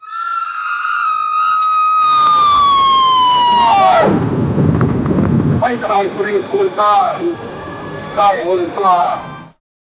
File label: major cities feature evac